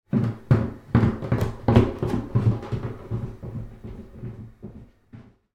foley footsteps down wooden stairs underneath creaky